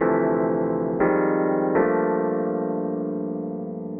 120 Rhodes loop Dm9, Keys, Nu Jazz House; PowerFX

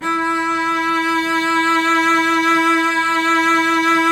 Virtuoso Strings; ILIO; Kirk Hunter Virtuoso Strings VOL-3; 90 s Sample CDs; CHMB STR ESP

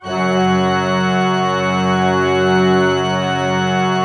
F2, Cathedral Organ; ILIO; Propeller Islands Cathedral Organ

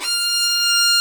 fiddle F5; InVision Interactive; Lightware VOL-1; Instruments & Percussions

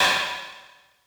OH Gong Long, Open Hat; Lost Tapes From Mars

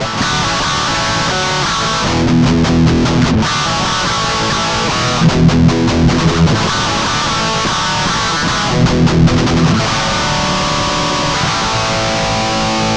Guitar Licks

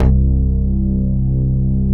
PUNCH BASS

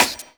Dance Drums kit; Best Service ProSamples vol.15; AKAI

